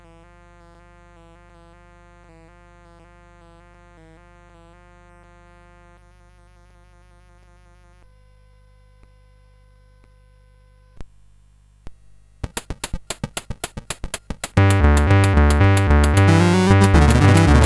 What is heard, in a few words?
Sax, CSG8580R5; Roger Ambill